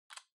Smartphone Lock Unlock Speaker

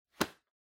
Hand Foley Pat Strong

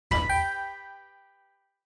Quiz Button Multi

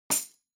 Tambourine Mute

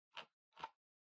PC Mouse Far Scroll Fast

